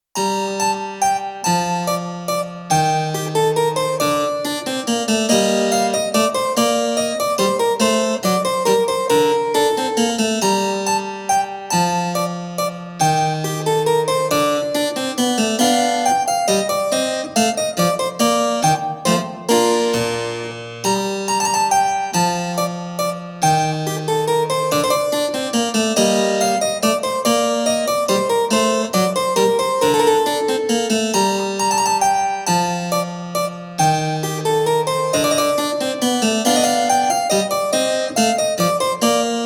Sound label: Menuet Harpsichord